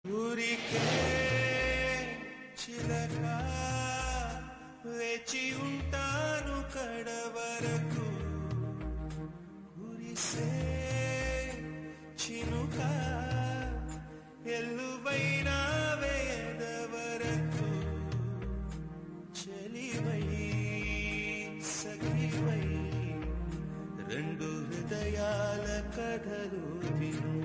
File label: Telugu Ringtone